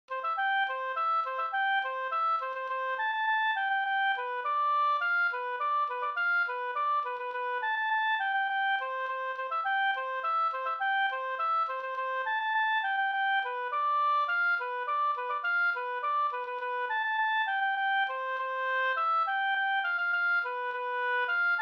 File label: polka